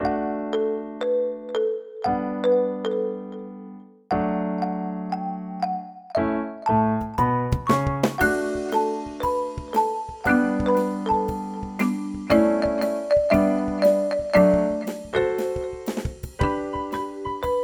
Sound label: Zizzing Melody